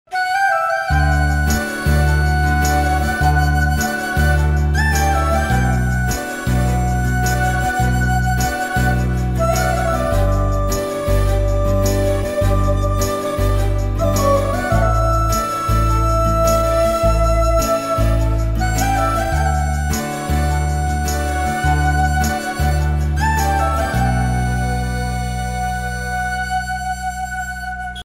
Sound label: tamil bgm ringtones